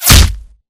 bullet light ballistic flesh; Titanfall 2

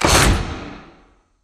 sliding doors open start; beacon; Titanfall 2

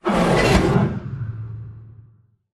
pod door ext verb close slide; Titanfall 2

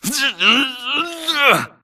grunt Struggle; Titanfall 2

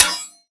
bullet light ballistic blades deflection; Titanfall 2

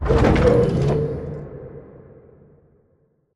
Giant Pillar Close Start; Titanfall 2; Boomtown

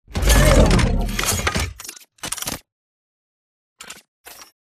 Fastball Lob Idle; Beacon; Titanfall 2

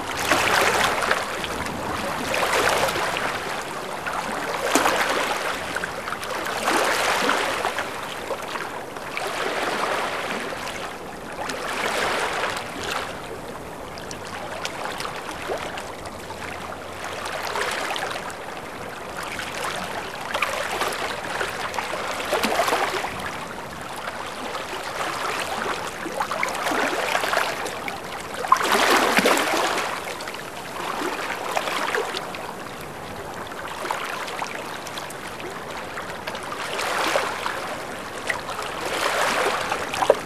amb lagoon water lap; Titanfall 2

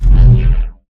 Movement Jog; Titanfall 2

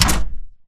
Mvmt FS Walk Default; AI Stalker; Titanfall 2

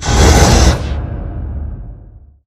sliding door lg verb close slide; Titanfall 2

